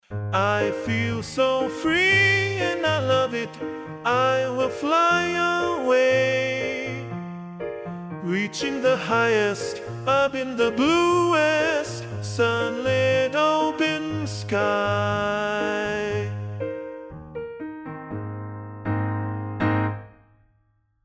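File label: Blue Sky POP, Pianoteq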